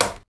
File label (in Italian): suoneria botton; beep